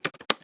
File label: Mechanical Keyboard Multiple Clicks